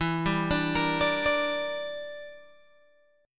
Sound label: E Minor 7th Flat 5th E, Guitar Open G